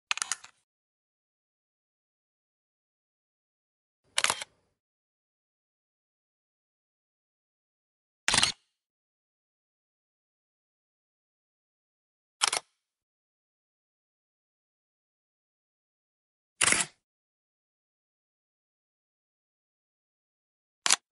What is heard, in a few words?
camera shutter sound effect